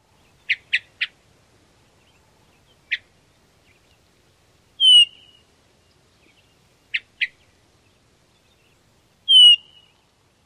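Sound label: Hermit Thrush churt and veee calls; NY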